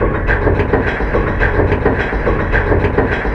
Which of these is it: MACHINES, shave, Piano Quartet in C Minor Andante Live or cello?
MACHINES